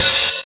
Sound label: open high hat sample e key